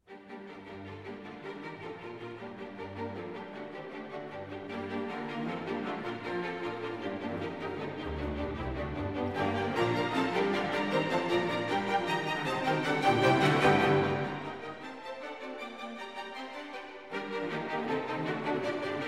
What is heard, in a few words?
Capriol Suite for string orchestra, Bransles; Warlock